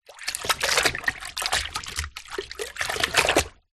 household mop movement in plastic bucket water; zapsplat

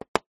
chalk stick thick set down on small chalk board; office

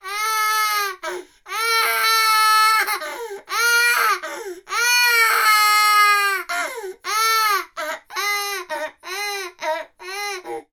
human 18 month toddler boy crying

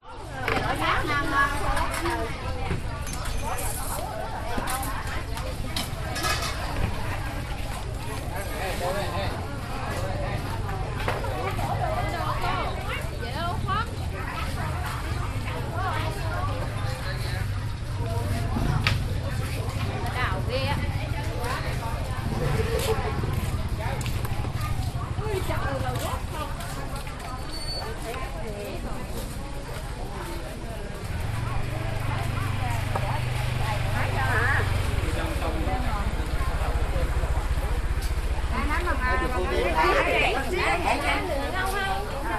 street market ambience vietnam people

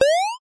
multimedia game bounce jump

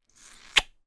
human kiss

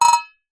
cartoon metal impacts taps rattle x4 fast

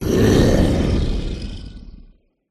Fire Spell Magma Roar; Sound Spark

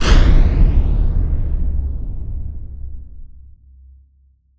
Impact Boom Flanged; bottlerocket

